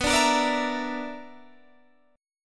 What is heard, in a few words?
B-Minor Major 7th Double Flat 5th, Guitar Standard; BmM7bb5 strummed; JGuitar